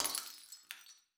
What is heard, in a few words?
beat break glass; netent